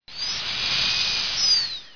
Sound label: DENTIST